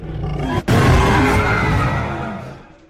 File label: cry; guest 666